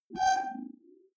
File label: query smooth high, signale